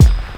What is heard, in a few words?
KICK, Hip Hop